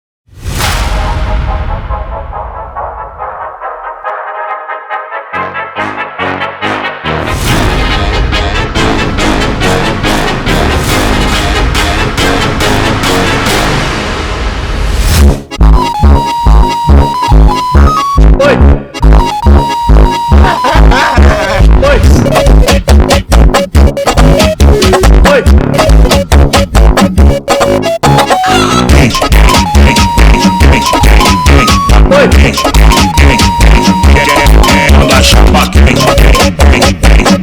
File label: brazilian phonk